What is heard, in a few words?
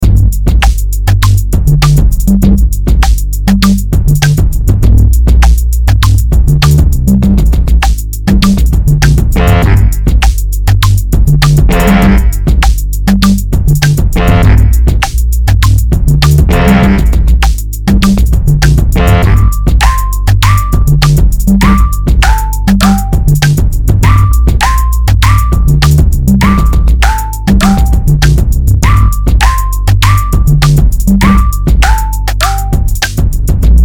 hardcore